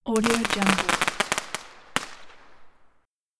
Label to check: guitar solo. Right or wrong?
Wrong